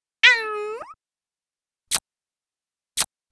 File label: get point; Pochi